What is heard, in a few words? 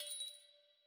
count up end; netent